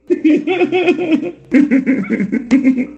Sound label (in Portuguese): risada